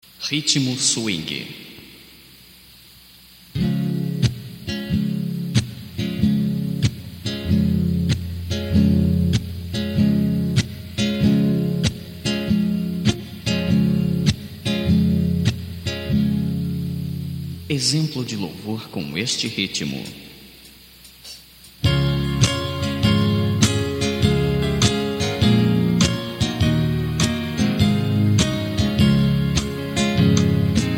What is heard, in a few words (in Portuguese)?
SWING, ritmos de violão